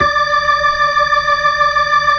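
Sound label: B-3, Hammond B-3 organ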